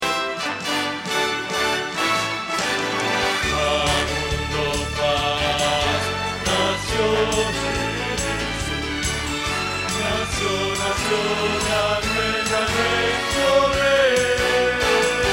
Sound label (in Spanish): Bajo